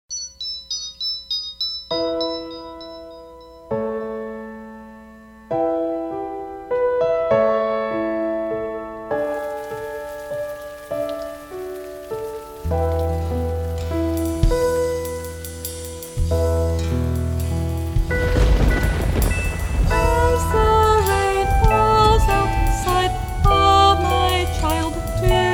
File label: Lullaby